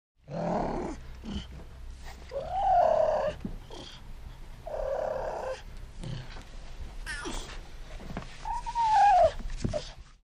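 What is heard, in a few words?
Cougar Cubs Purr Whine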